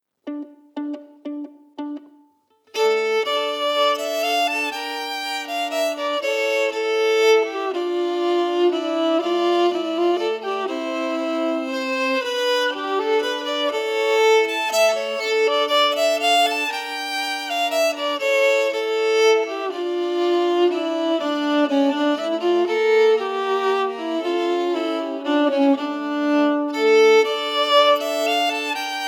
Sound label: Waltz melody emphasized; Hokpers Waltz